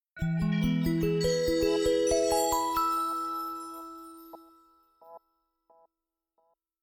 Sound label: Magic Bubbles Transition